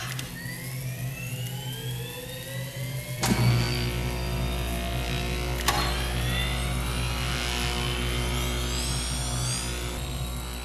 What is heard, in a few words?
Generator Start; Hidden Deep